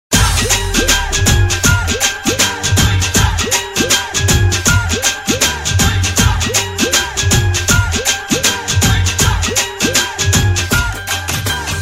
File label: Punjabi Dhol ReMix